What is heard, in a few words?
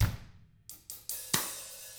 Double Platinum Drums, COUNTY